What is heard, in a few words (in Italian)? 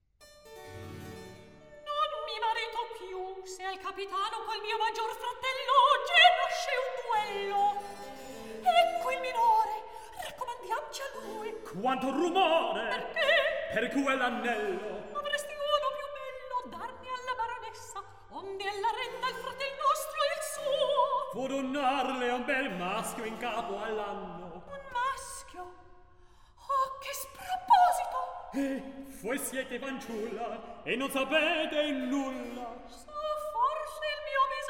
Recitativo; Non mi narito piu; Mozart; La Finta Semplice